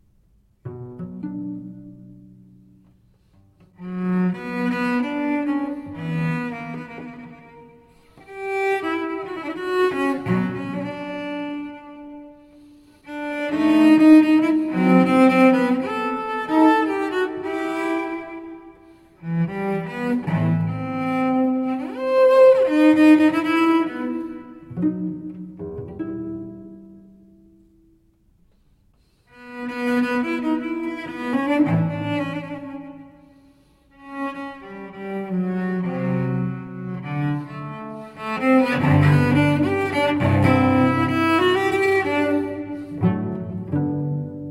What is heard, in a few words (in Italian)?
Recitativo, Sonata per cello solo in re magg; TWV 40,1; GP Telemann